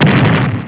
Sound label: explode; Insaniquarium; popcap